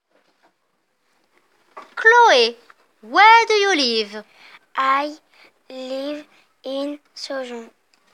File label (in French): vocal